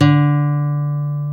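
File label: GUI NOTE, acoustic guitar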